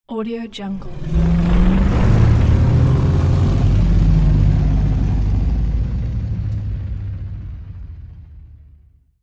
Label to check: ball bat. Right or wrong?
Wrong